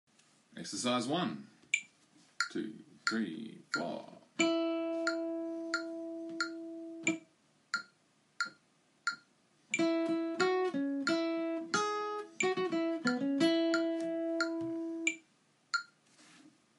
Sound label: Melody